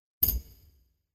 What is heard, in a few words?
computer invalid sound